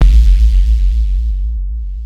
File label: Kick hum; Sonic Foundry (Sony Creative Software); Ugly Remnants VOL-2; Beatstuff